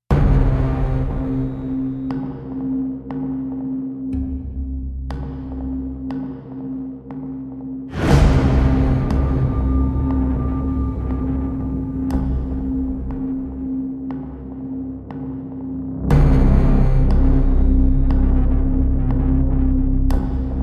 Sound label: The Greys (No Strings); Ninja Tracks